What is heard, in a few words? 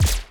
MOVE; C64 Dreams; Multi-Sound Default